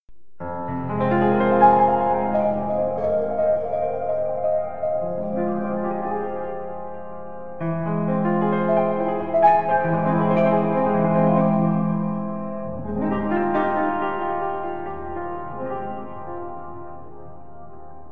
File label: piano intro, Le piano de l'intro